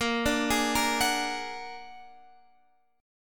A sharp Augmented 7th A sharp Guitar Standard; A#+7 arpeggiated; JGuitar

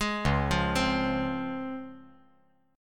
C sharp Major 7th C sharp

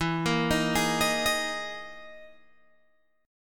E Major 7th Flat 5th down Guitar Open G

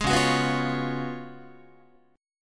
Db 11th, Guitar; strummed; tuning=4A,4E,5A,5D,5G#,6C#

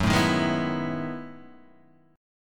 B 13th Gb, chord

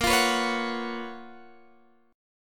F sharp Major 11th A sharp

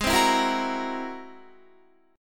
G sharp Augmented 9th, Guitar; G#+9 strummed; JGuitar

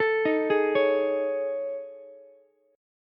A Major 7th Flat 5th, Ukulele; arpeggiated

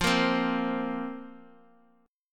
F Suspended 4th, down; strummed; JGuitar

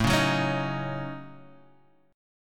A 6th Flat 5th, guitar; A6b5; strummed; JGuitar

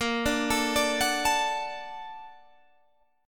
Bb Augmented Major 7th Bb, Guitar Standard; Bb+M7 arpeggiated; JGuitar

